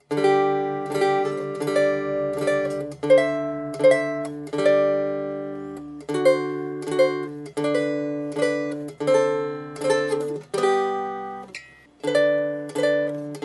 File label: twinkle, strumbly